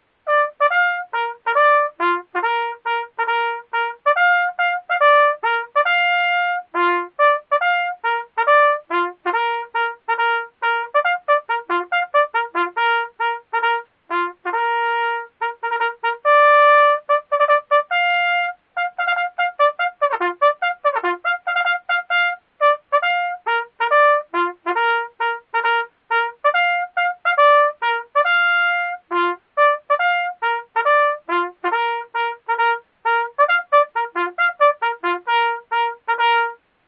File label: Bugle Call, Morning Colors